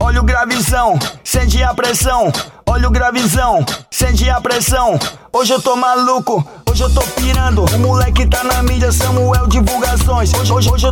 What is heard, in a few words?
tecno funk; samuel produções